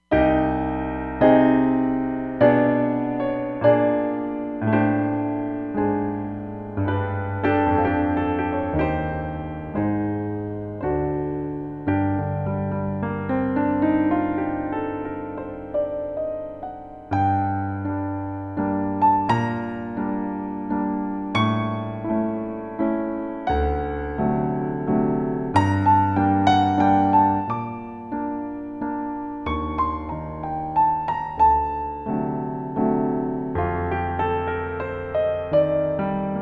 88 Concert Pno, Roland Fantom XR

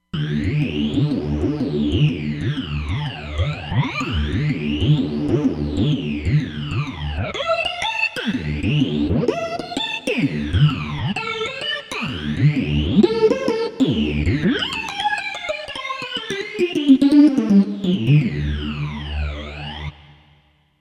Epic Lead

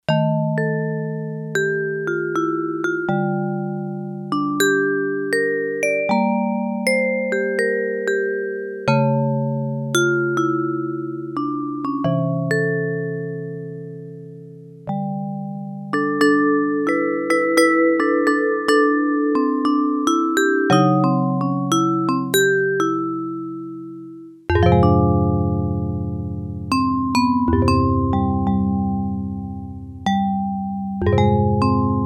Vibra Phone, Yamaha TX802; Factory Patches; Voices

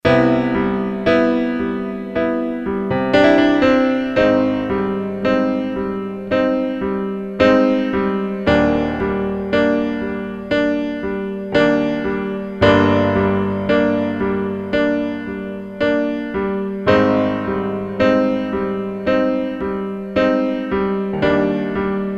GR. PIANO; Korg DSS-1

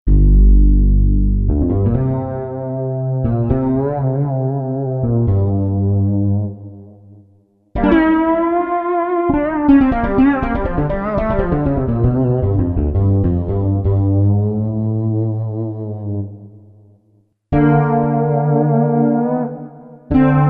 Roland SRX-07 SoloFls; Roland SRX-07 Ultimate Keys

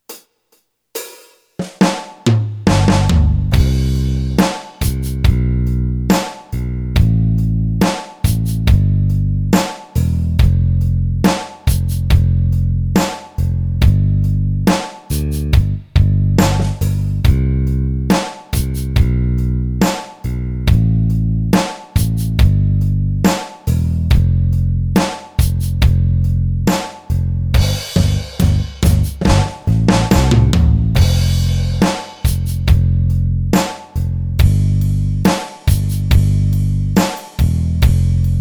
BALLAD

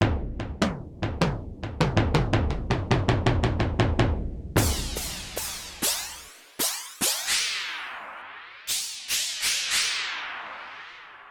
Pitch-EG Vari, Cymbals & Bass Drum

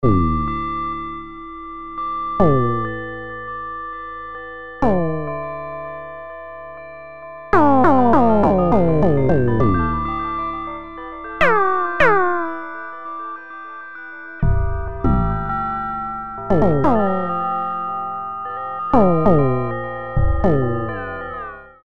Bells, Balloons; Green Oak Crystal

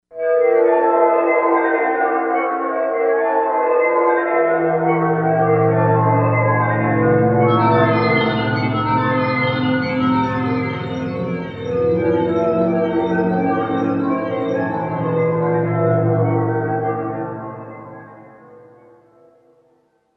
Waterphone, Korg M1Rex; Factory Patches

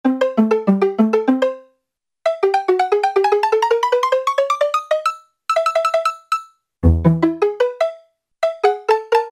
Pizzicato, Kawai K1m; Factory patches - I banks

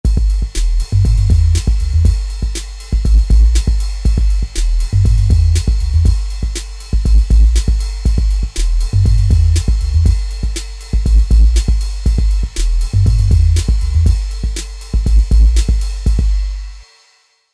Ambient

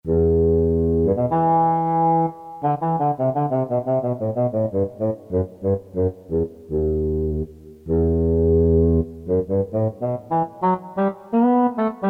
E. Horn; Reeds 2

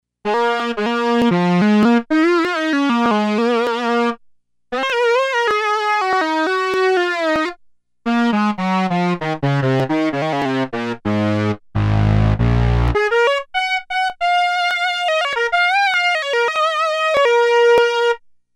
Sax

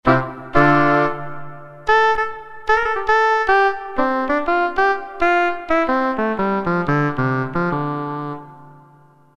Sax 2; Roland MT-32

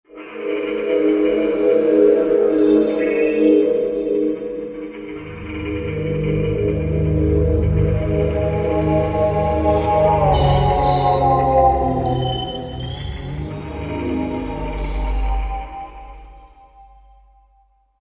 Horror Pad, Vintage Synth; Roland SR-JV80-04

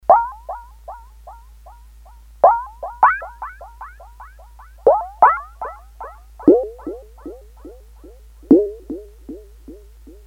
Water Drops, Korg DS-8; Factory Patches

